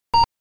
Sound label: beep